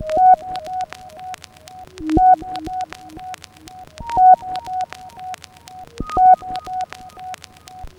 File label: keys atmo, jazz loops